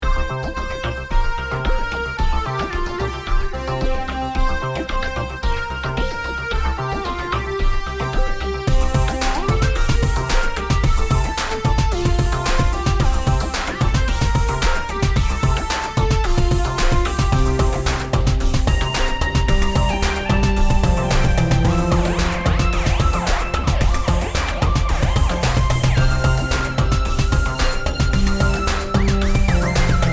miniboss loop; Laika 2.0

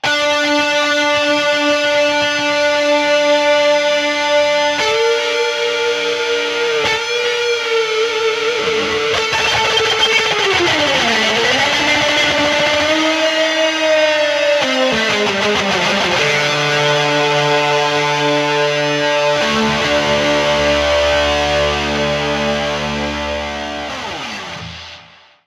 Metal Solo

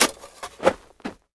Shovel Dirt; Prison Architect

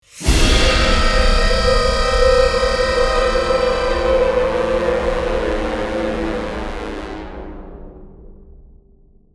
Mx Fodder Stinger

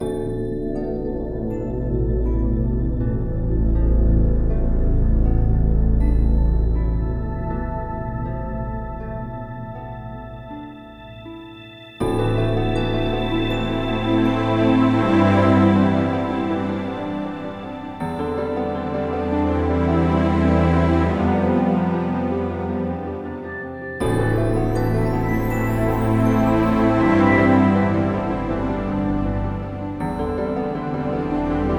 Shrine Broken Core Loop; Sparklite

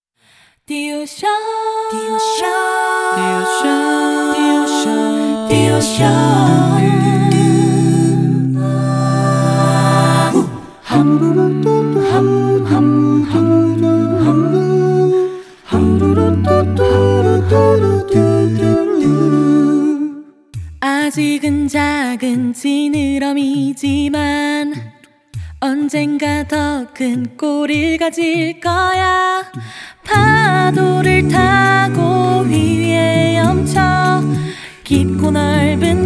Acappella; Cookie Run OvenBreak OST Complete Collection

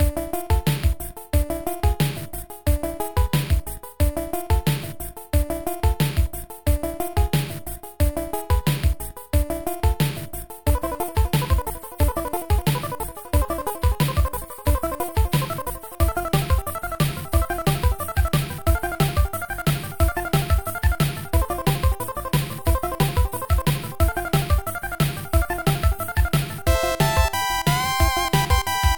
16bit dark forest

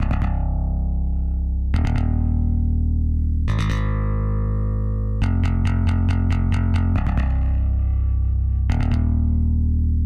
Drums and Jingles Exploration Bass; Metal Tales Fury of the Guitar Gods

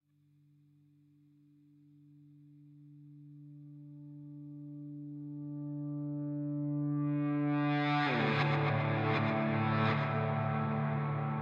Amb Guitar Rev; Worms Rumble; PS4 PS5 Switch Windows Xbox One Xbox Series XS

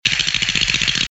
Teeth; F.A.M.P.S; Scary

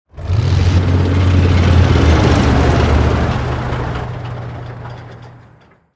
Enemy Tank Arrival; Godzilla Smash3

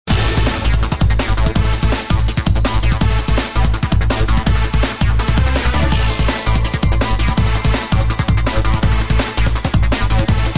Loop; Chaos Faction

